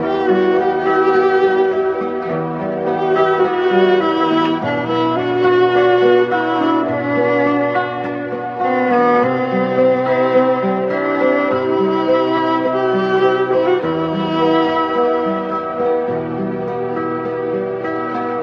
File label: strings and guitar, sad; loops